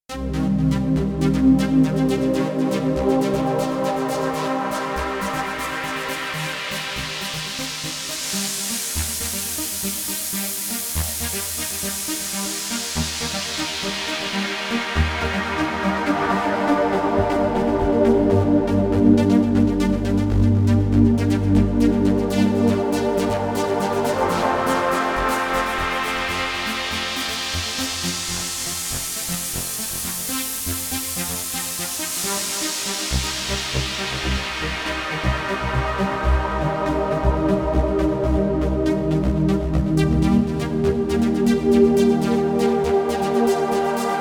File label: BPF Plus, Korg Radias-R